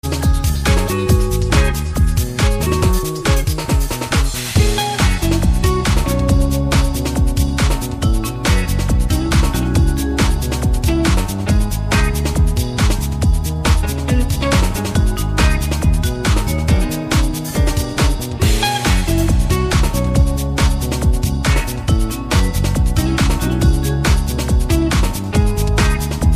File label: jazz